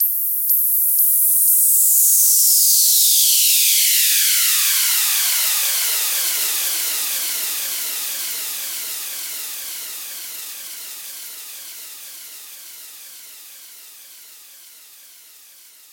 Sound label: filter sweep, ringtone